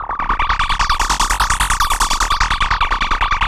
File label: Analog Rhythm